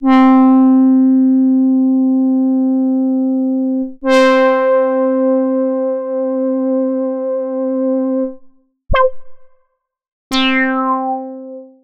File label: ARP 2600 Keys